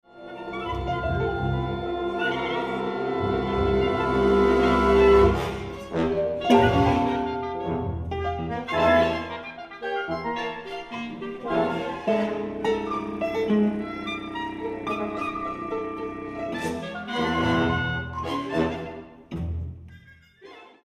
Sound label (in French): Pour Orchestre